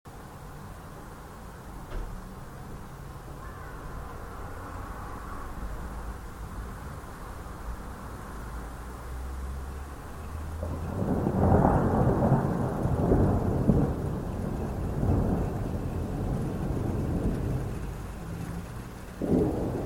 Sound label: a flash thunderstorm passing by